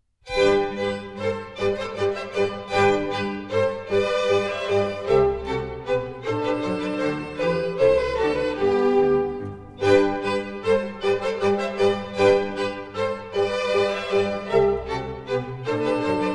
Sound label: Minuets, G Major; Mozart; Dances and Marches